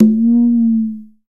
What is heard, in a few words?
Conga Battery, EMU E-III; Samples vol.55 - Retro Sampler